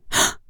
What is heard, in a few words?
gasp female